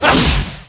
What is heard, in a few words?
Yeti attack, game; Eft